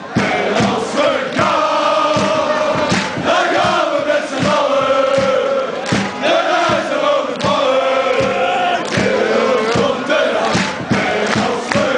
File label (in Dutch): als we gaan, chants